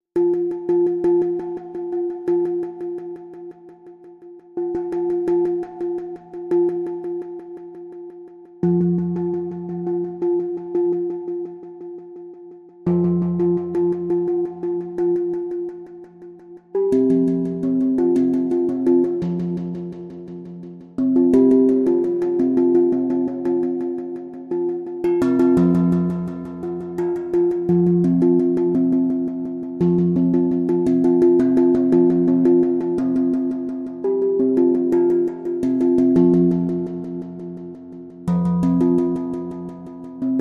Alarm Condition Pulses Only

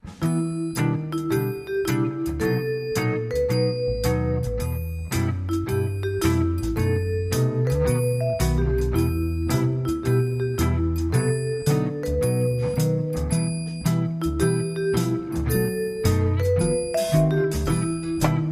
Vibes Underscore

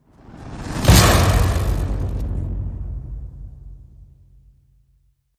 Hit Hor Faulty Lens

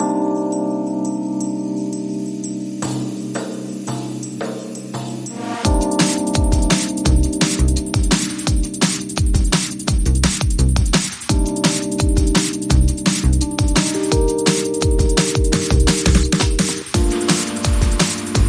Rushing Bouzouki, Underscore

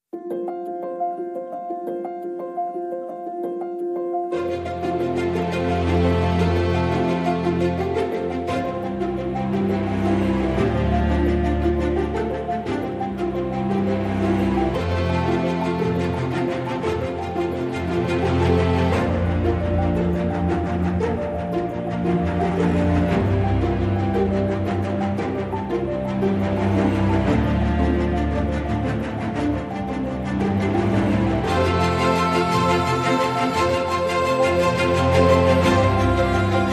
No Voices No Glockenspiel No Woodwind Mix

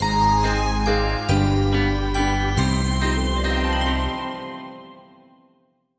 Faerie Waltz

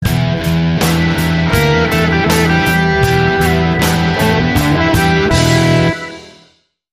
Rock Hard, Sting

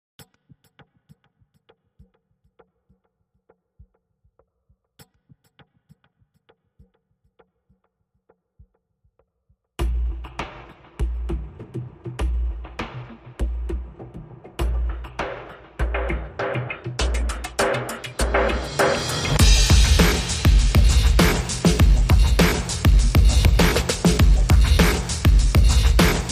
Drums Only

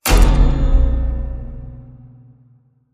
Dark Bell Boom

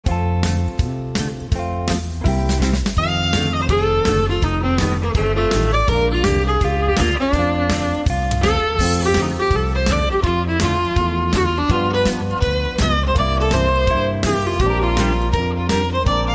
Nashville Two Step

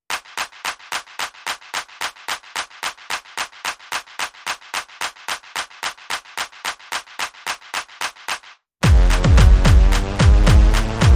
Perc and FX Only

